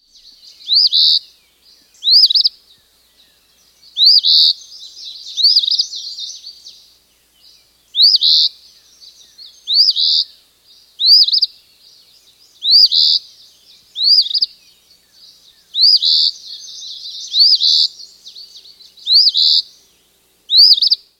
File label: Eastern Phoebe; Birds